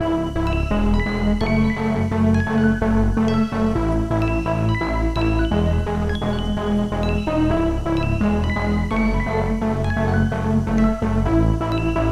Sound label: You And I - 128 BPM C# Min, House Breakdown Loops; Cymatics